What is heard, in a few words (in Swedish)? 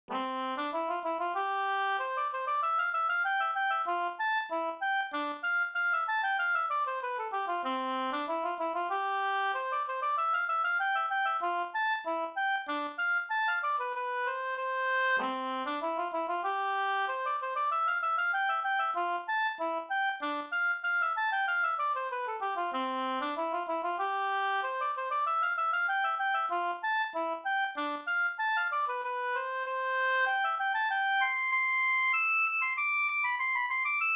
slängpolska